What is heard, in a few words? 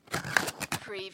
Paper box or cardboard box close